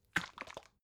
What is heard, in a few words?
bottle syrup shake single soft; berufe; gastronomie